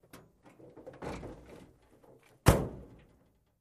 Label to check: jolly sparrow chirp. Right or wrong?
Wrong